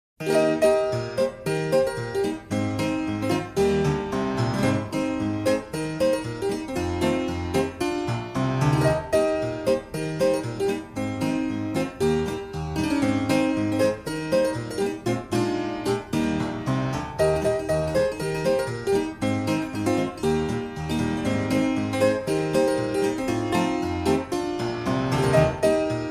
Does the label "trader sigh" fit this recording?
No